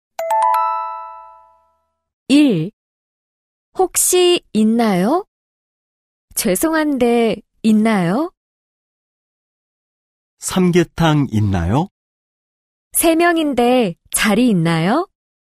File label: K-POP